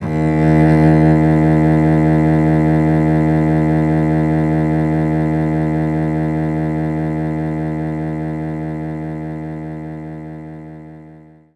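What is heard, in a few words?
Cello D sharp 1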